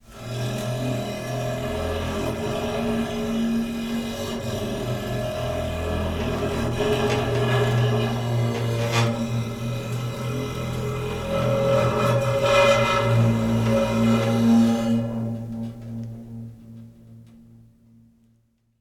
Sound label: Drying Clothes Rack